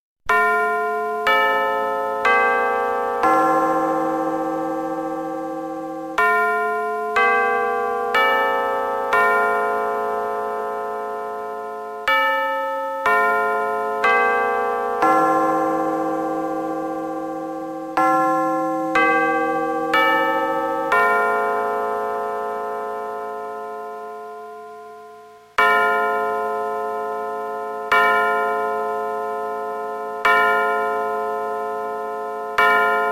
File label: Westminster Strike